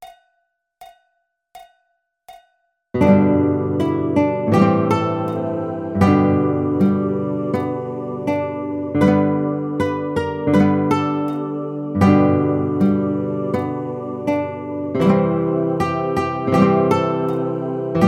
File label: Guitar